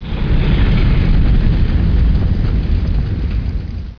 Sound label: burn